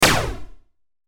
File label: shoot, laser, sfxr